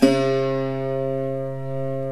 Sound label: SAROD